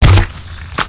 death; PC Gamer IT CD 41; Daikatana; superfly

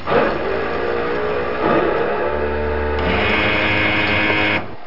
machine; blackhol

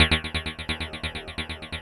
Echos 4 Beats, dance

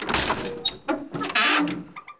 DOOR; Hidden & Dangerous; h&d demo

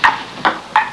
PING PON; Easy Personal Web Page

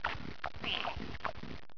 eat

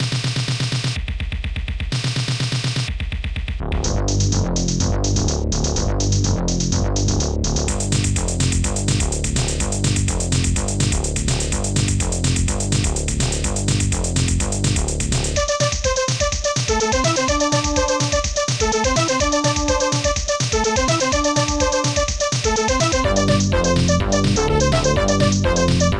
musak

